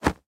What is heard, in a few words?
Weapon Cloth; Going Under